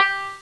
banjo soft; Sound Effects for Multimedia - Volume 1

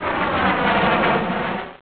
Takeoff; SimCity2000™ Interactive Demo

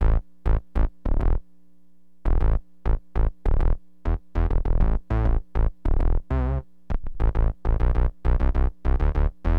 SYN BAS, Acid Jazz Funk, Bass

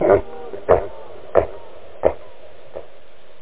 end aud; tothemoon!

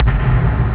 tom, drums

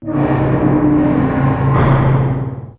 DEATH

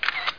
Camera; Road Trip Press Kit

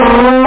EL GUIT; Loop Scream Tracker Sample

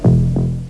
Heart Boom; Short Circuit

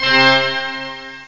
full pipe organ; OctaMED SoundStudio V1; mirage

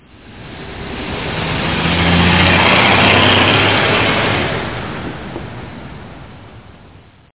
traffic

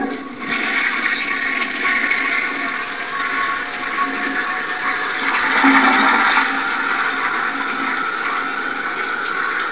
TOILET

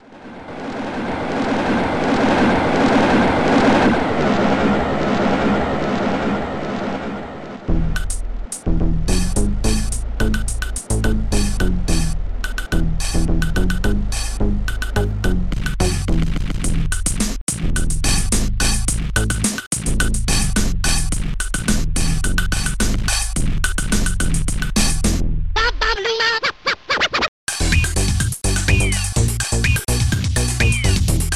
train beat; Digital Symphony Collection

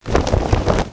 Scuffle; Princess and the Pea; SoftKey

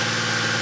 truck; Falcon 40

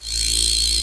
Magic; Majesty; OnlineGame

